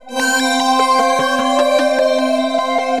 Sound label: SYNTH D+B 160 C, Drum+Bass, Keys; eJay